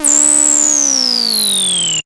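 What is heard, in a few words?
synth buzz; X-Static Goldmine 1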